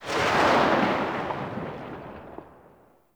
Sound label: Tail, Weapons; Warframe; Braton